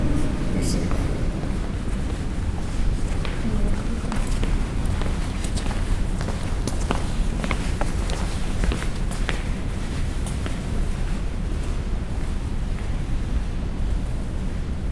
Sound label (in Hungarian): kettenelhaladnak katedralis